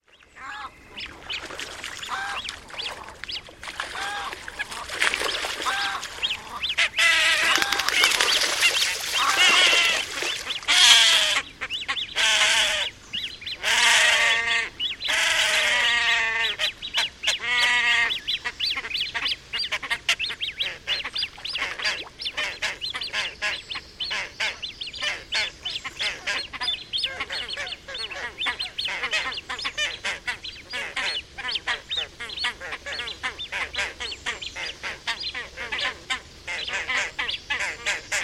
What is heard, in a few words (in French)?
grebe huppe; chant